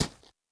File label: horse footstep dirt hard; Rust